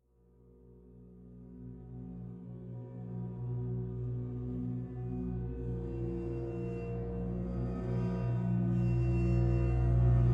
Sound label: Drone